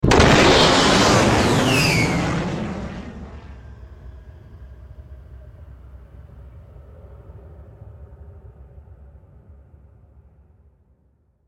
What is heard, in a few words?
jet fighter sound effect; myro the musical